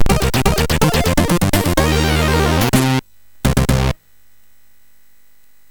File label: Jingles, MOS6581R3; hvsc